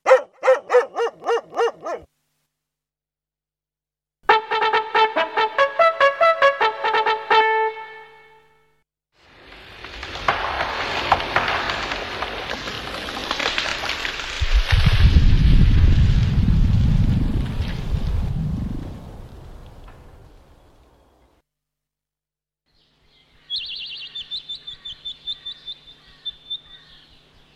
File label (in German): Waldgeraeusche Raetsel Ausschnitt